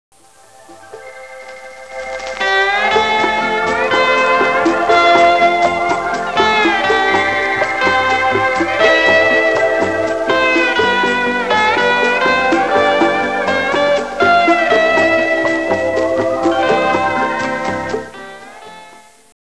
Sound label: suonerie musica traditional usa country; american